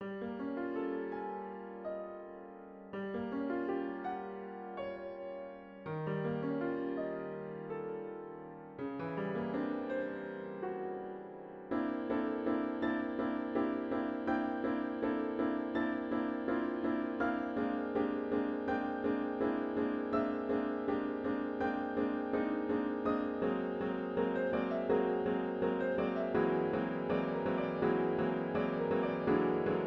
fonogramma

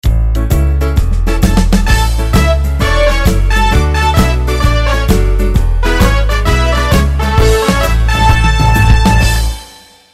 fanfary vstupleniye; zvuki k osennim prazdnikam; zvukovoye soprovozhdeniye

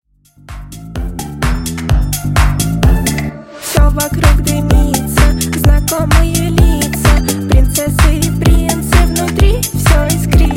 tanets

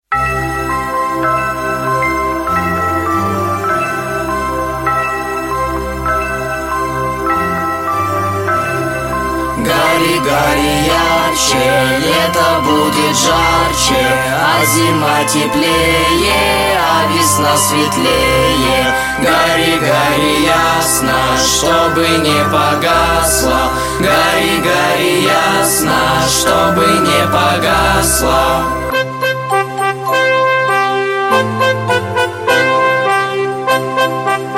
pesnya

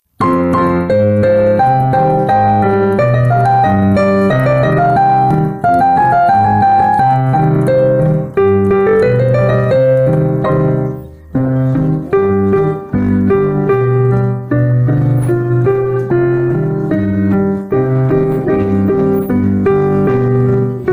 pesnya minus

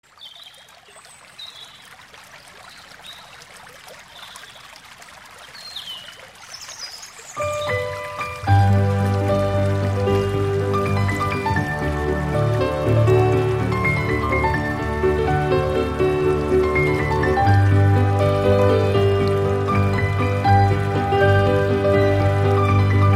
klassicheskaya muzyka i zvuki prirod vesna; vesennii sbornik

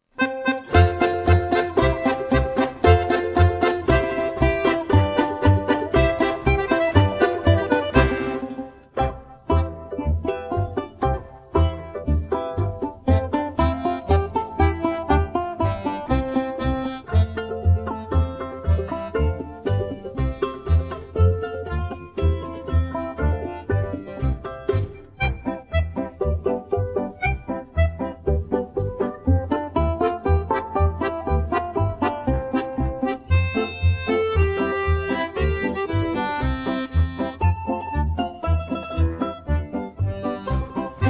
balalaika minus

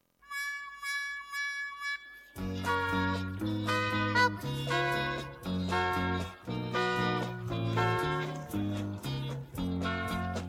detskii khor rabotayet zavod minus

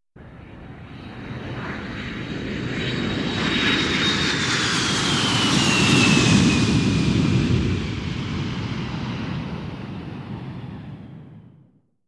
zvuk letyashchego mimo passazhirskogo samoleta

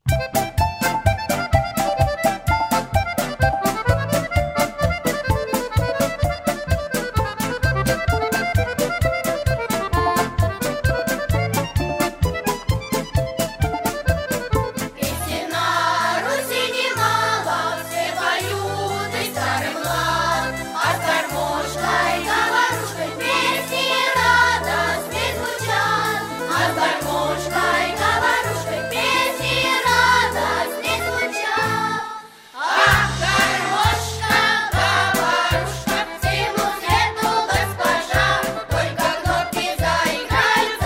vokal naya gruppa garmoshka

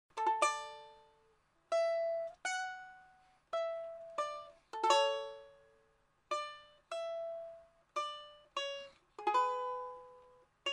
zvuki narodnykh instrumentov balalaika; russkaya narodnaya muzyka dlya prazdnika v dou

